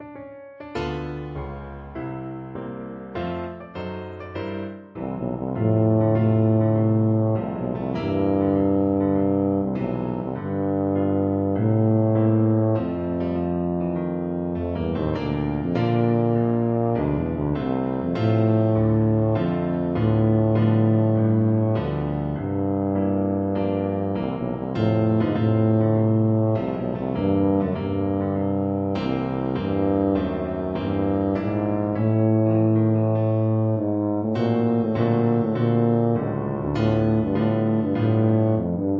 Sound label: tuba